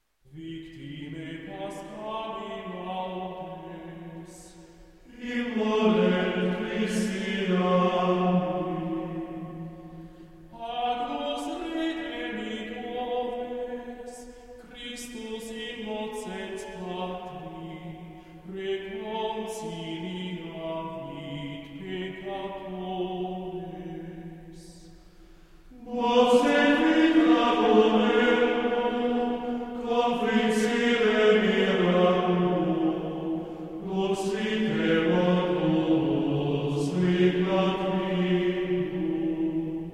Choralschola Victimae paschali laudes, Gregorian chant